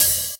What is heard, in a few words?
treble heavy open high hat sound d sharp key